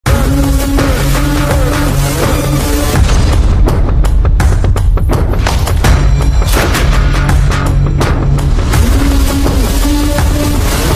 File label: bgm background music; new movie background music